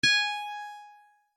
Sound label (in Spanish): Medalla Descarga; Pokemon Essentials; Pokemon Opalo